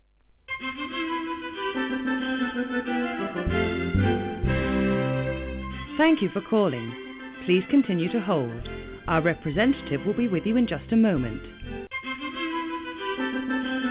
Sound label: Hold Music